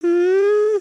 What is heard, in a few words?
curious, emotes